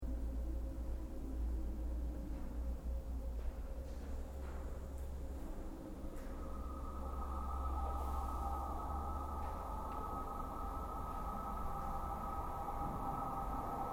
Train JR Shinkansen N700 Japan Exterior Arrive Station; Japanese Trains; Vehicles